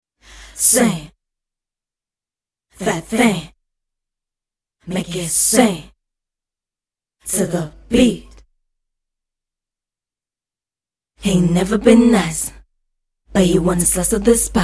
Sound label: HH rap sing male female 99 Em, acapella